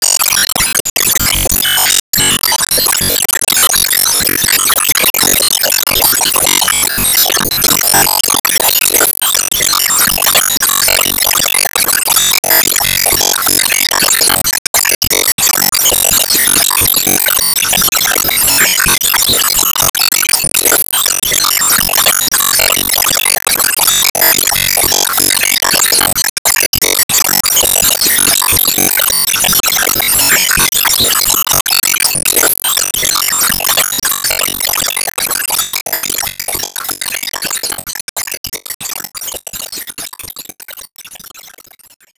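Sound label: Stage Clear Score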